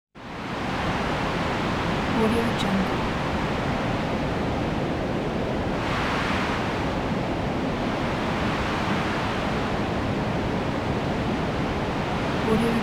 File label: wind